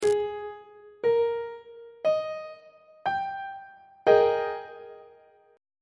g sharp major 7th suspended 2nd chord on treble clef